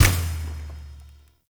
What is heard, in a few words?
Stadium Drumkit Destroy; Warframe; Ambience; Nineteen Ninety Nine